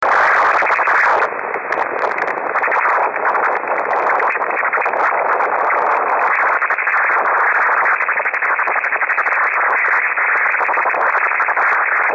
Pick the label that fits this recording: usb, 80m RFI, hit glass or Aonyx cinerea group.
usb